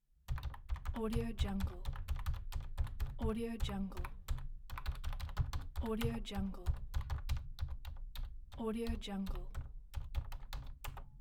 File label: keyboard typing